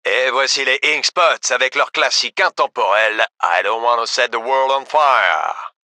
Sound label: music